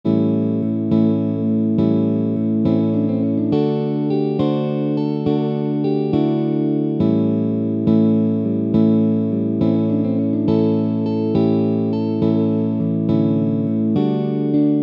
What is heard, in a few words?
Easy Blues Solo